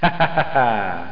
win game; Hang2000

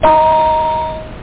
bing; columns

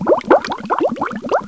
wash mach; Mr. Wonder's Greatest Toyshop on Earth; OmniMedia; theshop

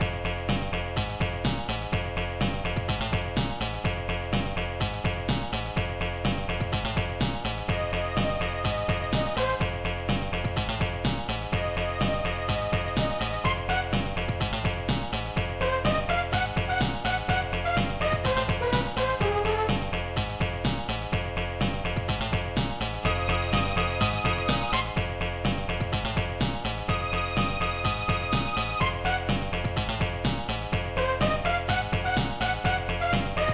Level One Music; Captain Bumper 0.58

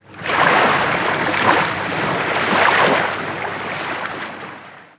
water lap

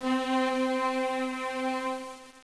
Strings; Castles - Siege and Conquest

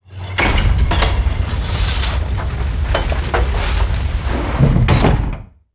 coal; buildings

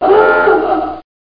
Notification

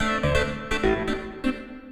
SYNTH HOUSE 125 A, Keys; eJay

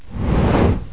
push

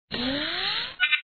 sound Clear; rescue lander

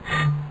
PHOOMP; Messiah

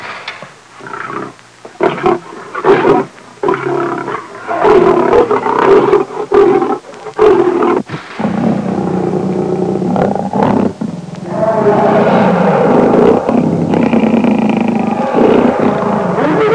angry lion; jungle animals; Sound Effects for Multimedia - Volume 1

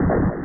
EXPL; stryker

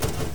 Type; RuneWar

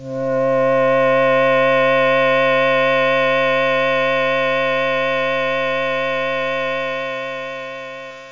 swell synth; OctaMED SoundStudio V1; mirage

